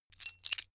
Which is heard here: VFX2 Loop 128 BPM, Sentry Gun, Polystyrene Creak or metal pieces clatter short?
metal pieces clatter short